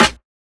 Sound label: snare dry rusty